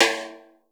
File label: REGAE S; Crypton - DrumBase5000; R70 KIT #1